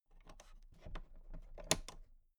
toolbox latch close soft

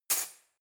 cutlery knife drawer place hard

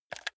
console controller r1 press fast hard

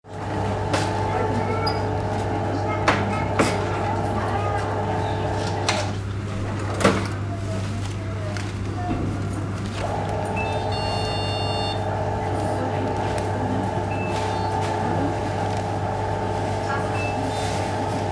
supermarket cash till

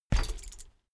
bicycle bike land rattle front

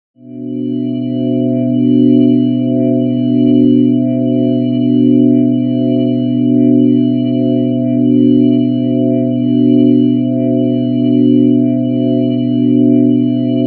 organ pad atonal

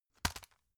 tissue package drop hard

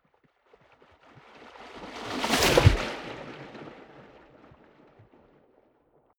waves splash long

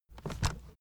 aluminium case steps

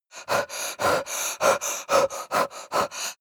breath female pant

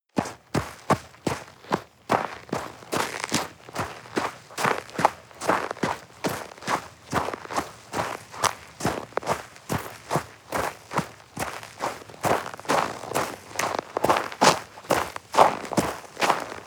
step snow walk hurried

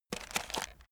chocolates take

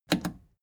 plastic button scratch smooth click enable fast

